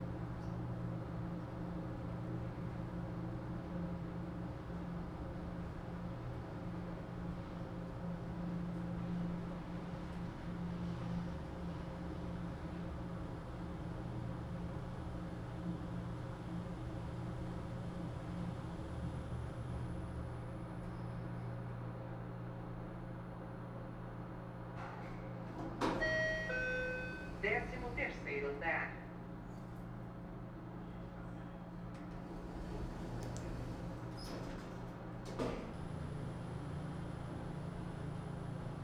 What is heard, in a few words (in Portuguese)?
Elevador vazio parando em andares; maquinas; colecao sonora do cerrado